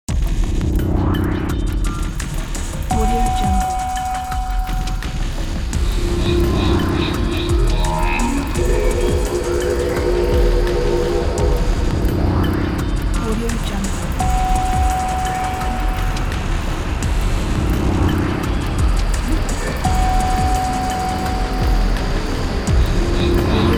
haunted mystery scary creepy; kipaloops

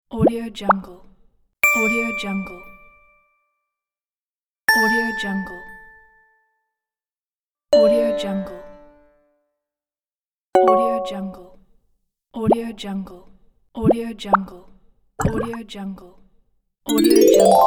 game notification pack

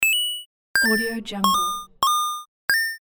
game coin pack

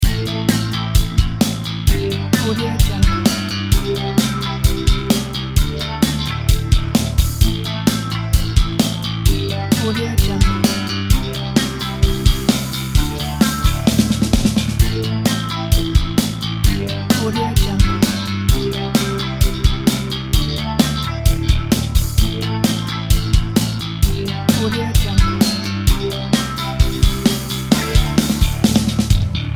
straight poprock loop; vice and virtue

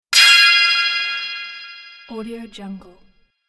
metal effect

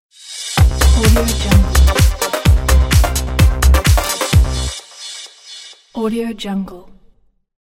club light; soundiz

